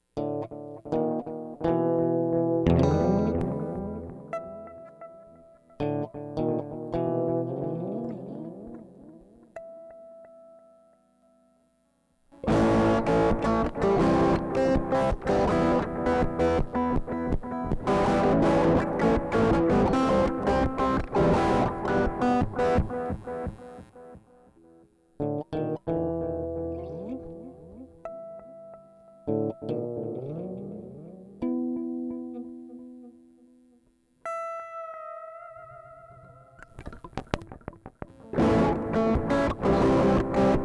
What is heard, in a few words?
Uprising of the Fall electric